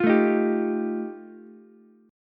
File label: G 7th Suspended 2nd Sharp 5th G chord, Ukulele; strummed; JGuitar